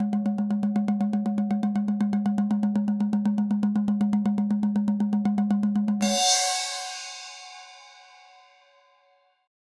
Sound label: cinematic